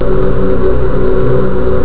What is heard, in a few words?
choir; Amiga Soundtracker sample